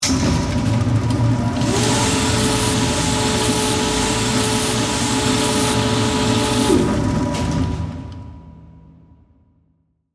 Machine Motor Whine, Scifi, Machine, Motor, Whine, Science, Fiction; Spacecrafts